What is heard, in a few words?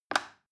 plastic button little release heavy